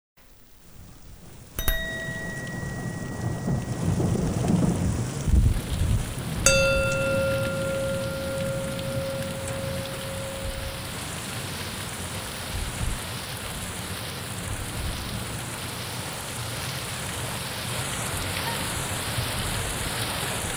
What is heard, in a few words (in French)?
La pluie d orage